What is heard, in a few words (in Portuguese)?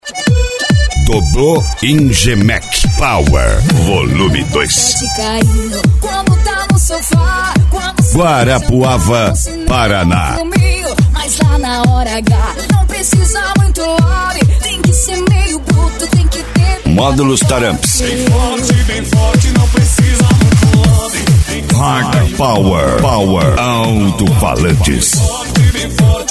sertanejo universitario e sertanejo pancadao